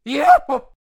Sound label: Monkey King Attack Vocals